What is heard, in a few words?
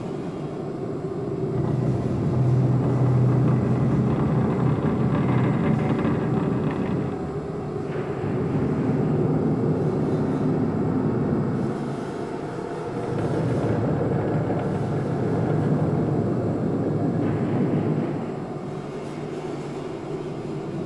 Galleon Medium Room Amb; Warframe; Ambience; Grineer Galleon